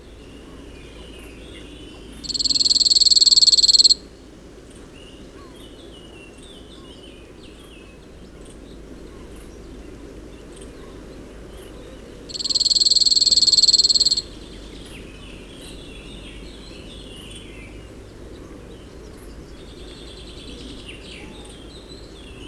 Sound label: Cirl Bunting